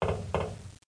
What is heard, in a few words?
DOOR; Renaissance